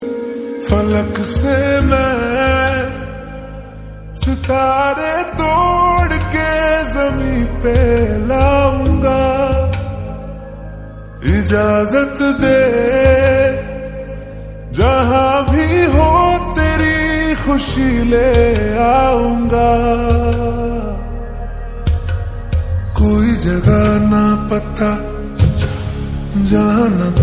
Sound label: Lofi